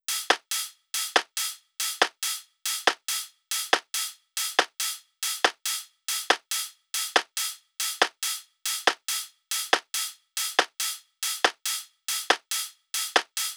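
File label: drum Loop 140BPM overhead Perc; Vengeance - Essential Clubsounds Vol.5; VEC5 Drumloops; VEC5 Multi Loops 140BPM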